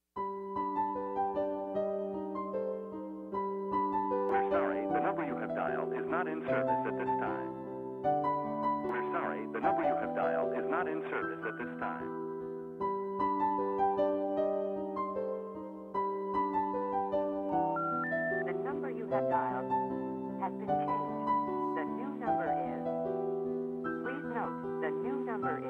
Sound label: bgm piano